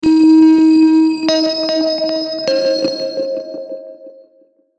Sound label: Logo Three Tone Swirl; Sonic Logo Sound Effects; Production Element Imaging Element Accent Transition